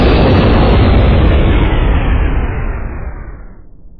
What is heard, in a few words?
explosion; KameHameHa; dbz